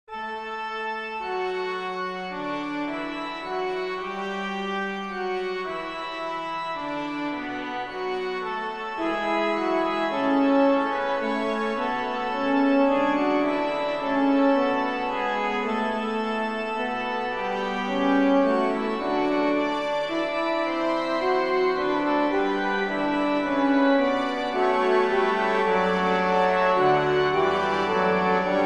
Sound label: Brass Org; Brass Organ